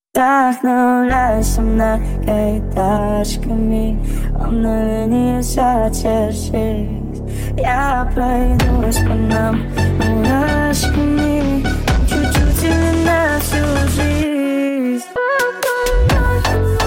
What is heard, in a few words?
phonk remix